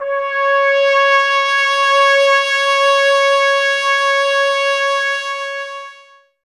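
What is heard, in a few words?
brass trumpets C; 90 s Sample CDs; Miroslav Vitous; Woodwind Brass Ensemble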